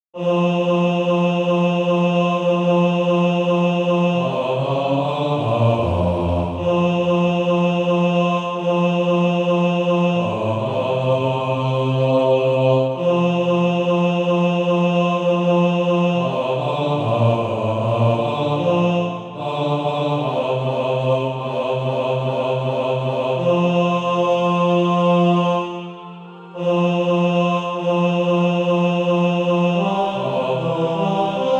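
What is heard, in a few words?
bas, Practise Music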